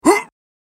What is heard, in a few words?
"Ugh", samurai voice; samurai voice selection 2